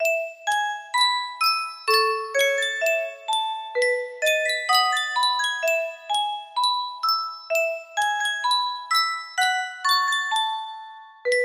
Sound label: music box